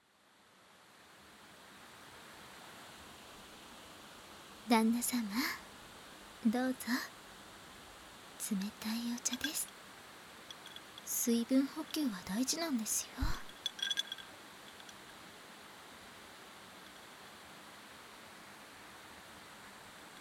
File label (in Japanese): お外でお耳掃除; 夢見月の湯宿その2～専属仲居2名付き極上プラン(ハイレゾ音源)～